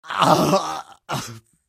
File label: pain severe; engineer; source engine; tf